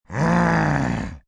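Growl Low Male, Growl, male, short, low-pitched (human voice); Groaning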